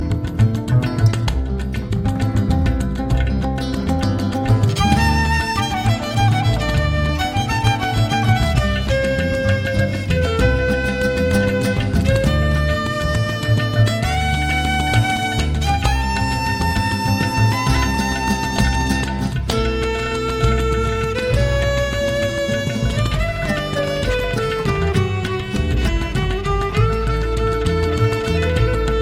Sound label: Irish Raga Instru